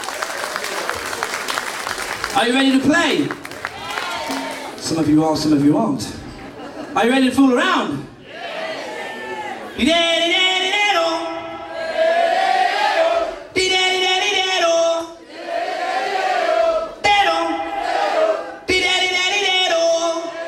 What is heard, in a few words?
Vocal Improv.; QEII Live in The Isle Of Wight